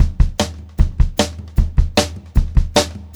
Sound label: Brushed Drum Loops